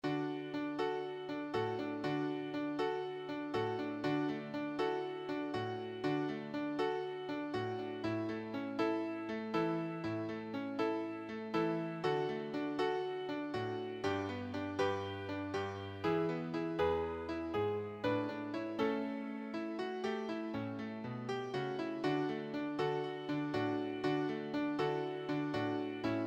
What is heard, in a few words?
Beach Song Key of D Accomp